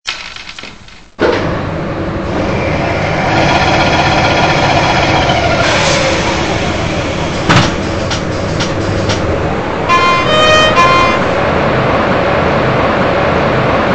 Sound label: Elok SNCF BB 25100; Savoie